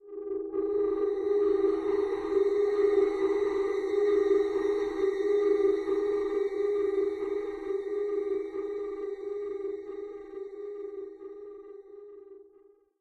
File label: Stinger Escalator Top; The Park